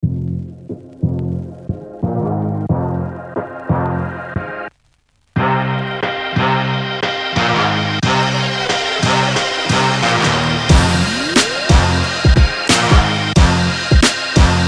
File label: boombap classic